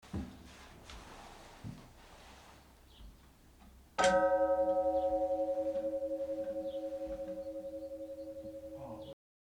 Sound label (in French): cloche; St Agnant de Versillat